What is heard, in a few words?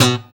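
GUI PULL, acoustic guitar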